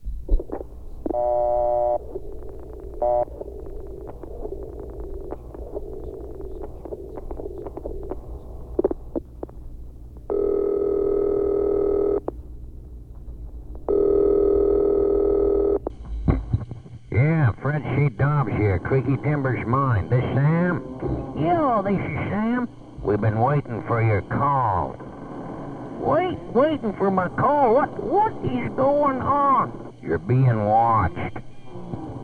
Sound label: creaky timbers with phone calling noises; bellingham to baja the mighty 690